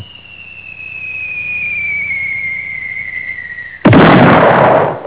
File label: falling